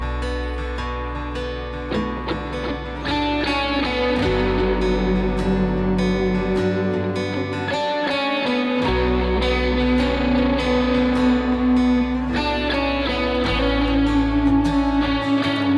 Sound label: SOLO